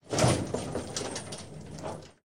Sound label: rocket reload; tanks; War Thunder